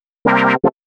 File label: 120 synth licks C, Keys, Nu Jazz House; PowerFX